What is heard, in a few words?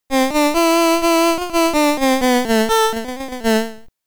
Jingle When Johnny Comes Marching Home Again; Head over Heels; commodore 64; SID Stereo